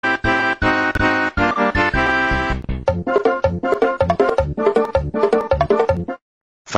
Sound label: goofy ahh carnival music